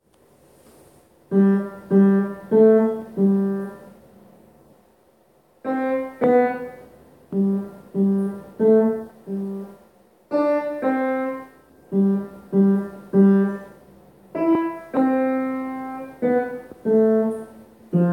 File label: Happy birthday piano, Happy Birthday to You Played on Upright Piano